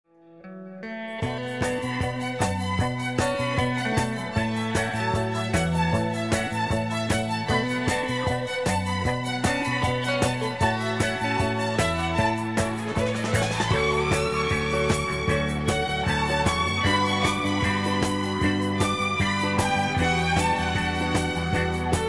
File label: Rockin Strings